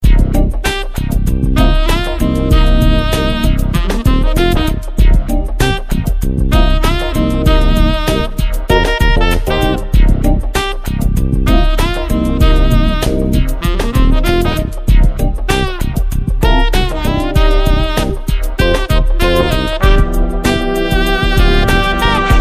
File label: Bip Bop Hip Hop